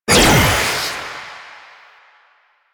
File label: Jade Scythe Proj Whizz; Weapons; Stalker; Warframe